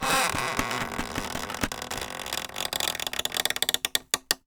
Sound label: Polystyrene Creak